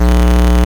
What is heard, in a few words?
Bass